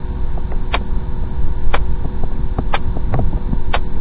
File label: clock tick